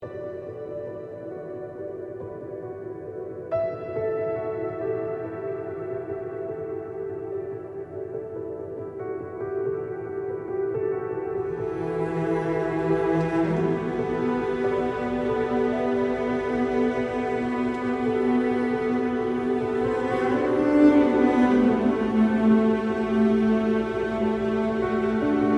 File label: Piano Choir String; Dragon Age Inquisition